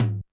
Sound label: Tom, mxr; classic machines